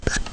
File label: chalk, magic sounds; tuxpaint